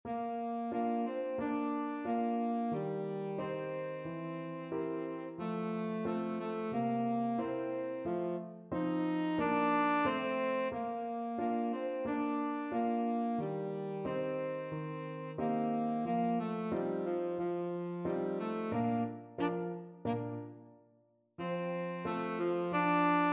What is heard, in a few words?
tenor saxophone